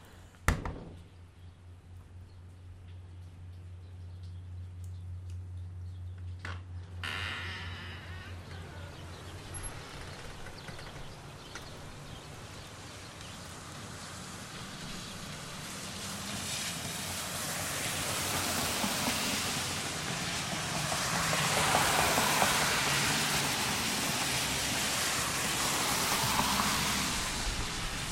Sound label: ambient